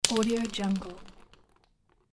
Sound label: pen rolling